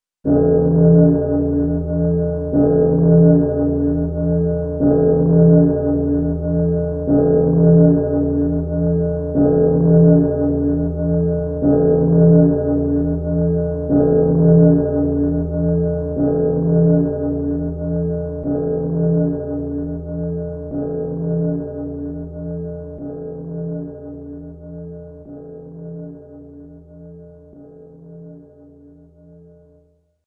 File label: Last Bells; Clock Tower 20th Anniversary Sound Collection